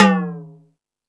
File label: More Toms, Drum Machines